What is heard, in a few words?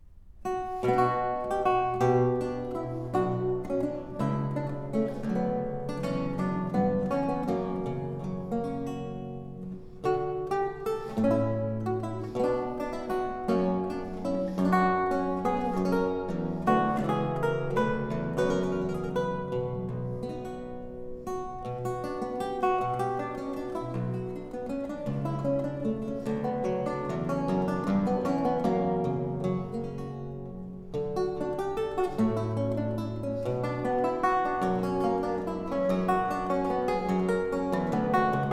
Courante, Lute Music